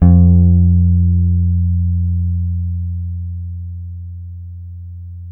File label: F 4 JAZZ GTR; Univers Sons; Jazzistic